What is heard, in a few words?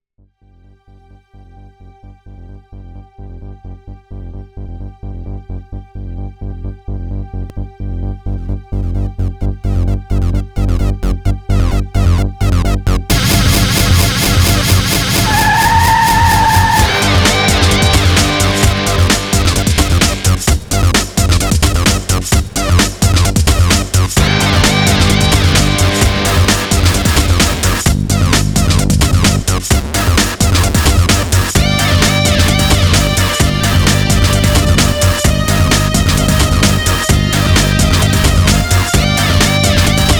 Music GAME HIGH ENERGY